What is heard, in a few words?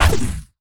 shoot laser; SFX; Cielonos